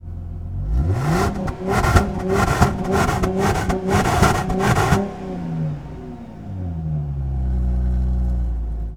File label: Chrysler 300M Rev; Automobiles